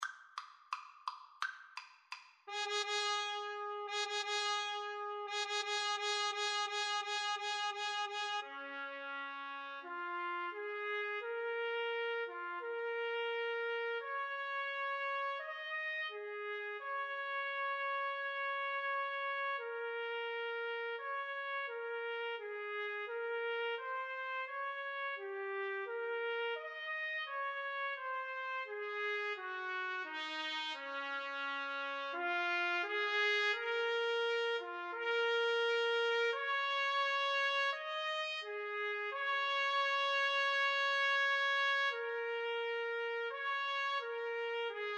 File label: trumpet duet